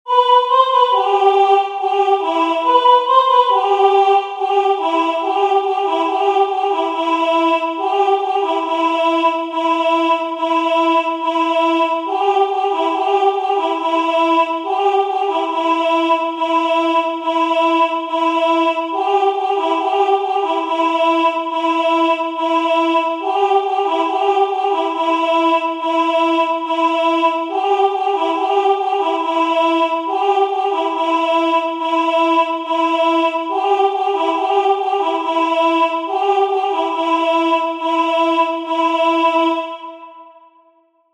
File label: song caddo; The Ghost-dance religion and the Sioux outbreak of 1890